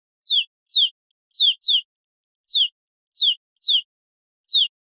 piadas sueltas; verderon

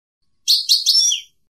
chicolios; campero

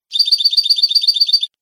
cascabeleo basto, campero

